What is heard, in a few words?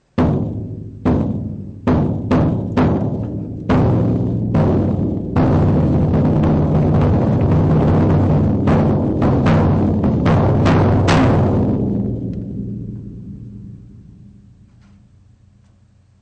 bass drum; western